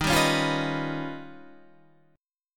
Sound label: D sharp Augmented 9th D sharp